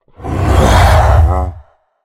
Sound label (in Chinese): 咆哮声; 劫掠兽